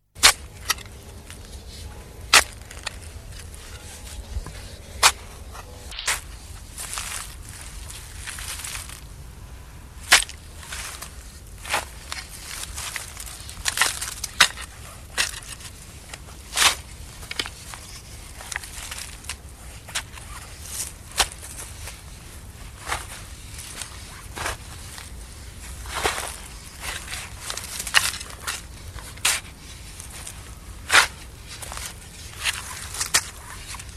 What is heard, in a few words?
Digging With Spade; SOUND EFFECTS1